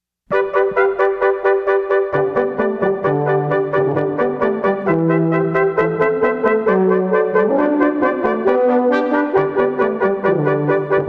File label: jingle bells, brass